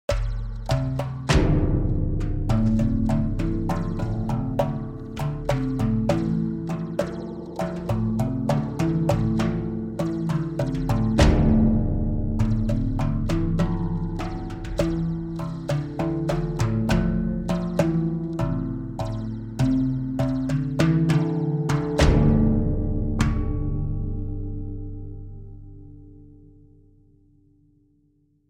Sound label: Iron Pendulum Solo